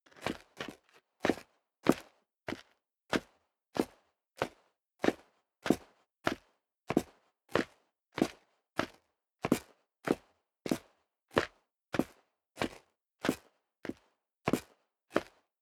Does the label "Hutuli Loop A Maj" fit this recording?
No